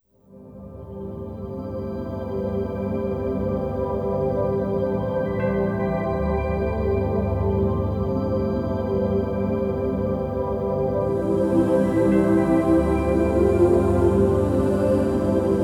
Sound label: church theme, music; Pirates Of The Caribbean